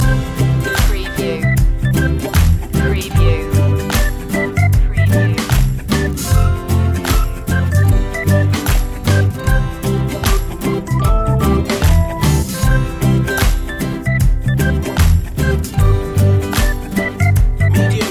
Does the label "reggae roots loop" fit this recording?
Yes